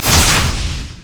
power transfer; LoL; viktor

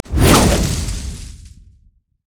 javelin toss; nidalee base

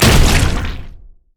crit attack; Zac

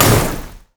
barrell roll boom; LoL; classygragas

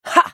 spell cast effort, en US

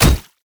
q spell bounce; LoL; ziggs poolparty

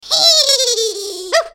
Maokai laugh

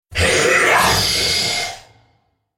Droid spellcast effort; Khazix; Character Voices; English

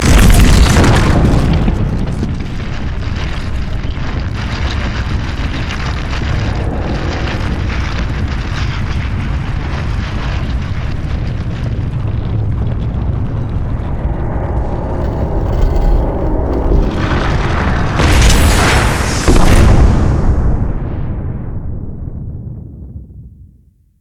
stair extend; odin

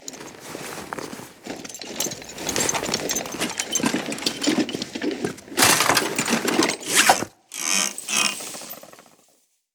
hermit entering

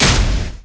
twinbite dragon; LoL; shyvana

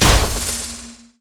arcthrow miss; LoL; diana skin02

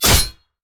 basic attack sword; rengar

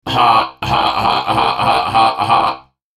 Steam Golem laugh

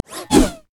steam golem basic attack; LoL; blitzcrank

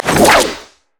wildcards; LoL; twisted fate

